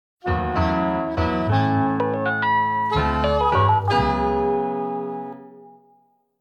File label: Jazz, digital; 500